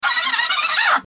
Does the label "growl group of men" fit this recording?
No